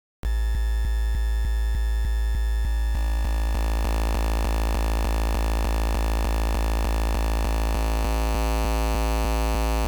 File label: sine grit, LSDj Wave Synth